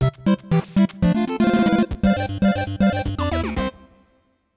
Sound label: Jingle, Clear!; Nintendo Mural; pushmo 3ds ost